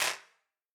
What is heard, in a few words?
Wider Clap